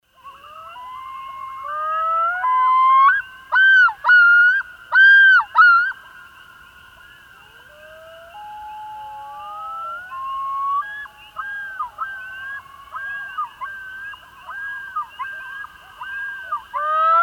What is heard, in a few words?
Loon Yodel; Lang Elliott